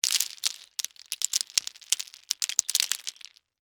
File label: Debris Mixed Nuts, Pile; Texture; Materials; BlastWave